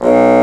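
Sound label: Bassoon B0; Digital Sound Factory Virtuoso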